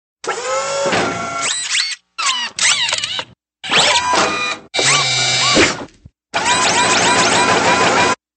laser message, sms; ringtones

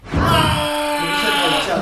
roar

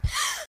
Gasp

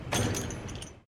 Take from Supply Box

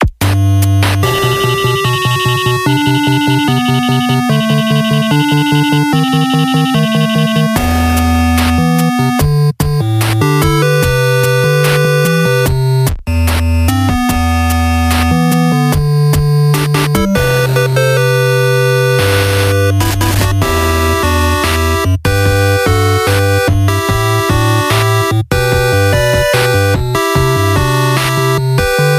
8bit

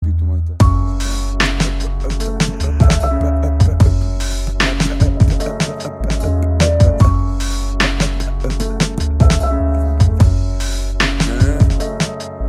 instr

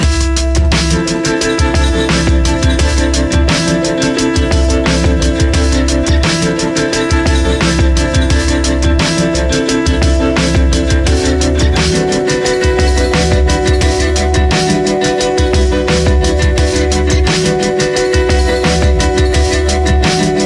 Beats

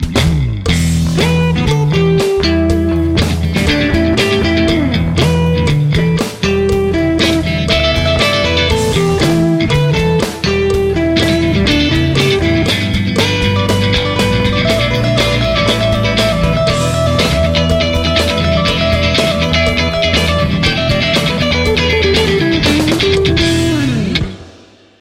Rockin Jazz

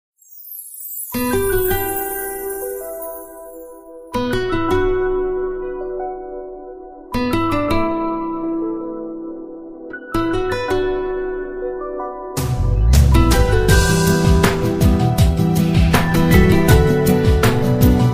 a light ring tone

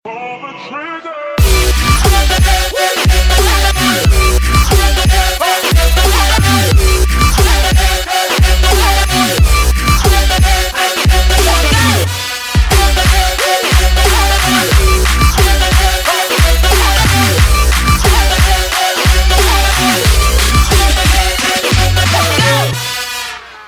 Trap

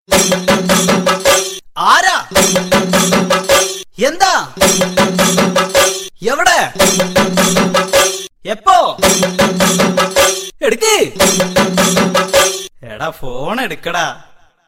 nice malayalam tone; ringtones

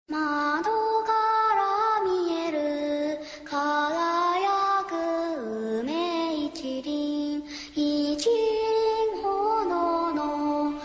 asian rap beat

